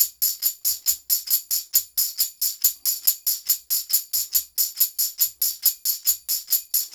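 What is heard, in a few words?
TAMB, tambourine; Drumdrops In Dub VOL-1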